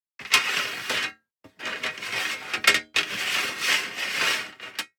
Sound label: DICE on Metal, Slide, Standard, Five Dice; Samples; ArticulatedSounds; DICE Sfx Party (Extended)